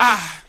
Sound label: "ah", male